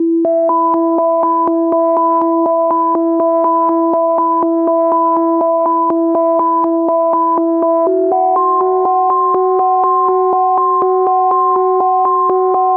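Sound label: 122 BPM Melodic Arps F major D minor Tonic D; MMB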